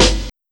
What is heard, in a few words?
old school snare single hit F sharp key